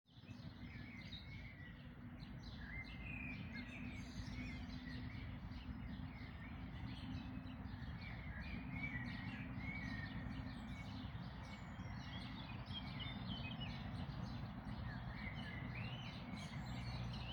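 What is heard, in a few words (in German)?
Dawn Chorus, Vogelgesänge vor Sonnenaufgang; der dawn chorus 2026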